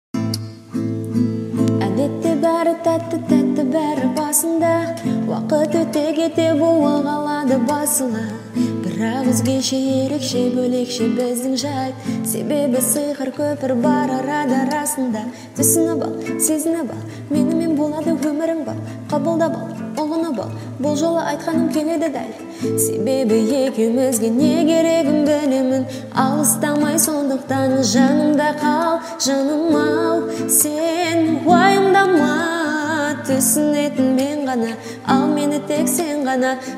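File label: guitar cover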